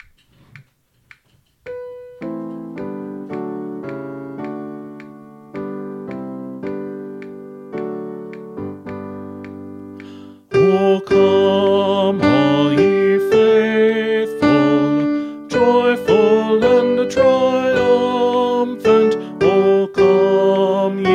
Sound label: O Come All Ye Faithful Tenor; St. Columba Choir Practice Tracks